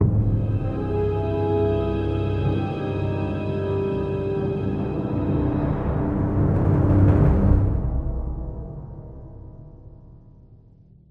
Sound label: Sting Horror